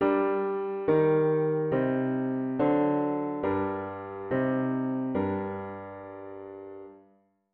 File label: root V7 bass